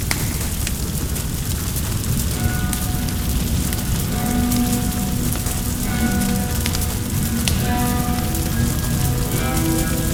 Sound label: House fire